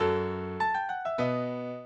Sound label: minuet, mozart